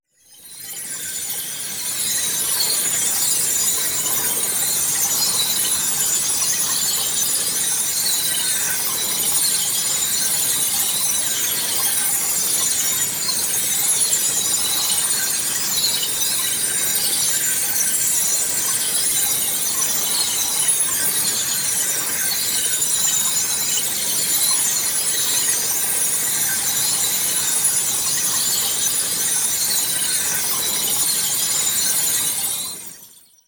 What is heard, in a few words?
PARTICLES SMALL SLOW Neutron Stream; boom library; Neon